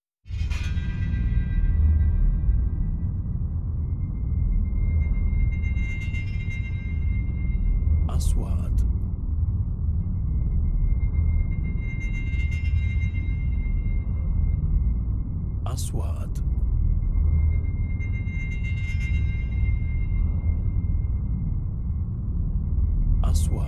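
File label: 3D SFX Trailer; مؤثرات تشويقية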